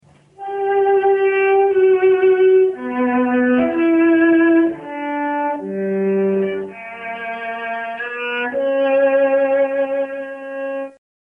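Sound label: cello; violoncelo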